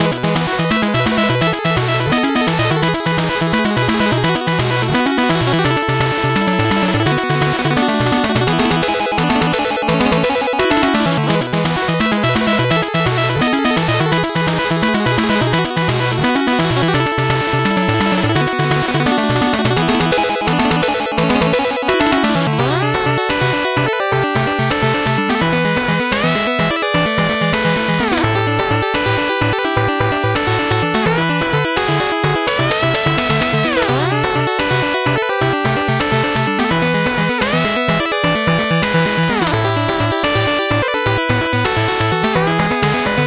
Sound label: 8bit bgm slow